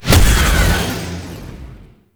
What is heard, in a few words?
Impact Small; Weapons; Warframe; Duviri; Incarnon Adapters